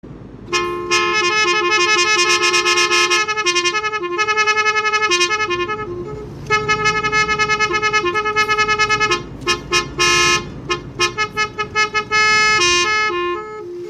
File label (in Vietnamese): Tieng coi hoi xe khach